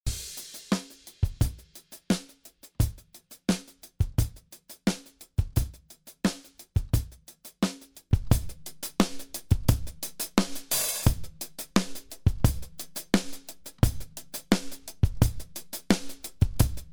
Drums Schlapp Knackig

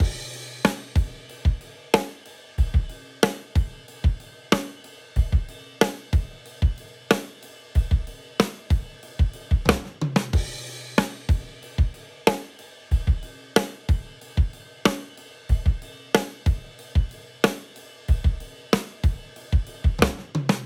Akustik Set

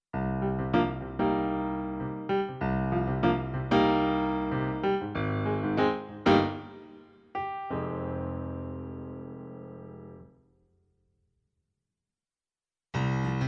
Diverse Pianos; Havian

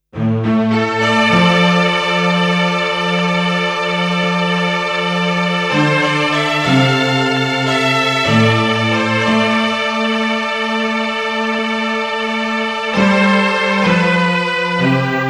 Stringpatch mit hoerbaren Loops

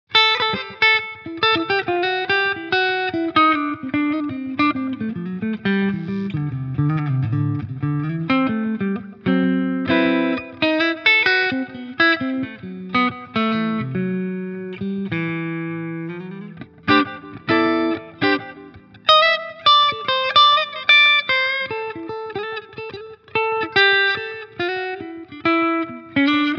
Hals clean; Harley Benton MR Modern CAR

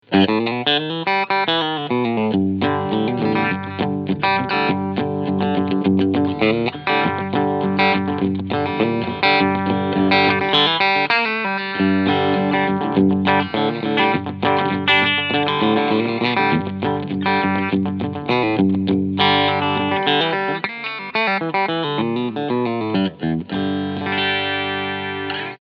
FGN Boundary Iliad Cherry Sunburst Cleansound Steg SC

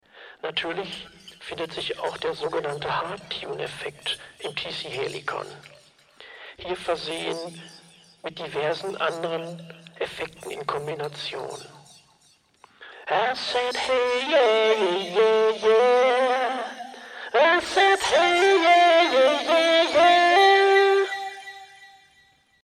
FX mit Hardtune

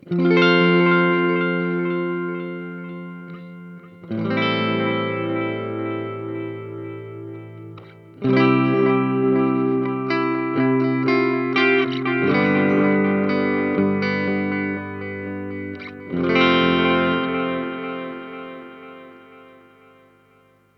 Boss RE-202 Saturation aus

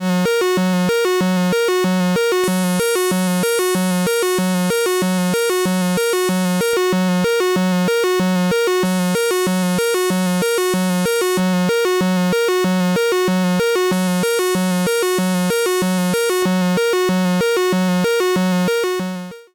Erica Synths Pico System 3 SQR und SQR Reso

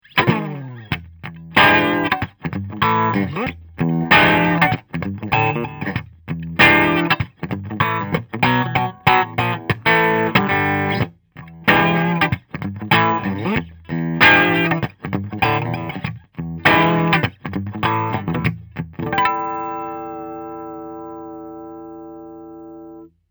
Kanal 2 Tweed

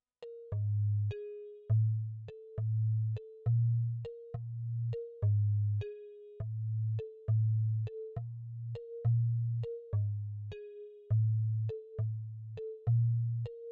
Volca Mix JAM Volcas Direkter Einzelabgriff

